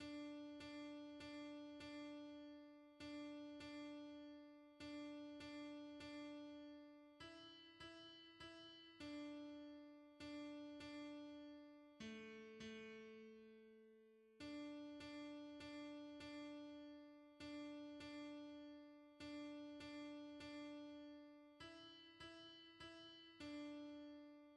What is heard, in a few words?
alto; Seigneur Jesus ton amour reste; hymns